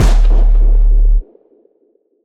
Impact

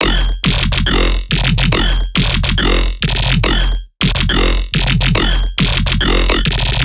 Riddim Bass Loop F Oldskool (140); Ghosthack; Riddim Essentials 2020

